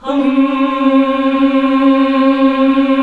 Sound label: HMM, Nuns; Sound & Vision - Gigapack II